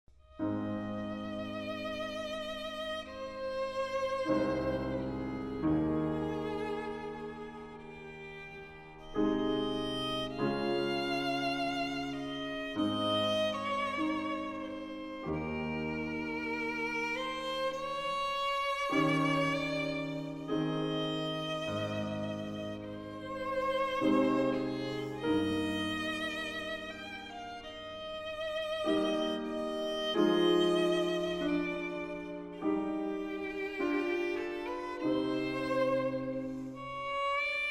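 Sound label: violin sonata; franck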